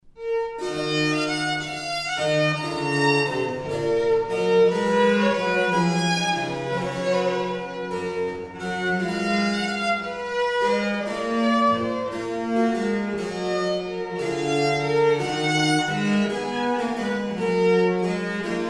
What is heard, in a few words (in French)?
Air Lentement; Philomele